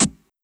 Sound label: Closed Hat